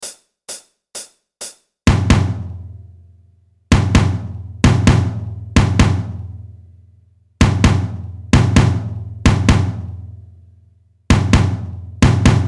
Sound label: jam drums